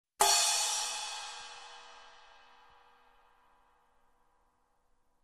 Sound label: Ride Hit Hard